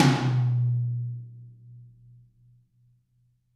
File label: Birch Tom Hard, Drums; apulSoft apTrigga2; Steven Slate Drums